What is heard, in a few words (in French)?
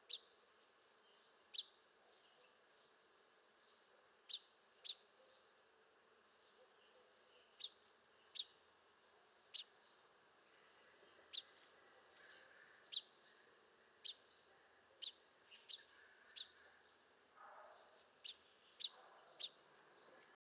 Paruline jaune Setophaga aestiva